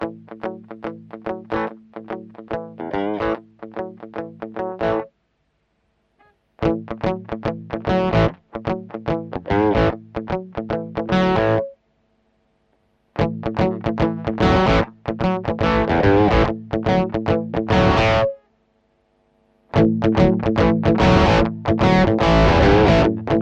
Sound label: strato british higain center; gt2